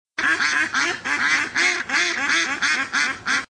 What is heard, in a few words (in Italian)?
anatra domestica